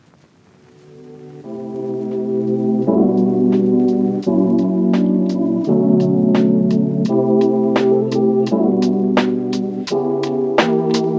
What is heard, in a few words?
lofi